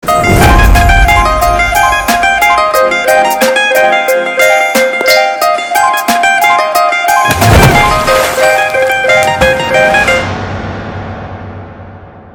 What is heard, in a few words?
Instrumental Ringtone